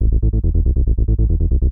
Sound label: TECHNO BASS 140 A